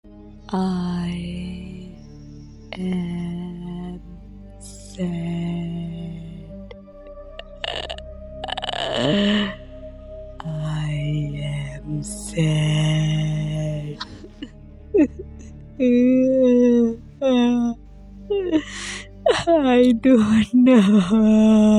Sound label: creepy girl singing i m sad scary horror voice